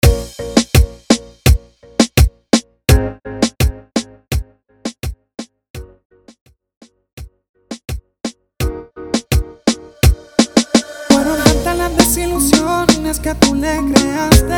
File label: Intro Outro Dirty 84 BPM Reggaeton